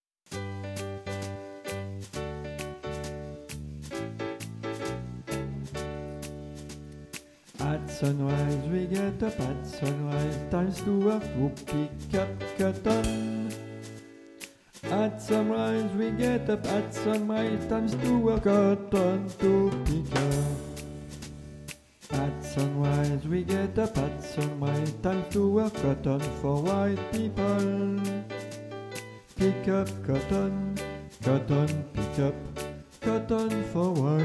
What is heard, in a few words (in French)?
bas